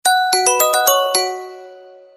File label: sms music box ringtone; sony ericsson